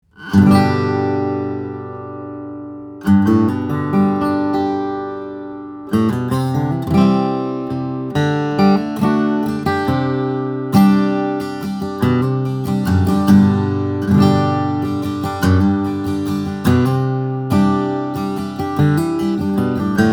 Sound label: 000, steel string guitars flattop; koa; red spruce; wayne henderson